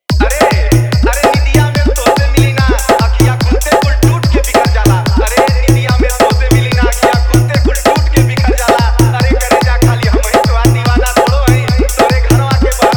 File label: JBL Bass